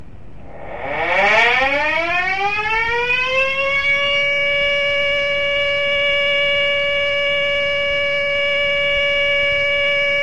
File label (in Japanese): suimei, discharge alarm; kawakami; kizugawa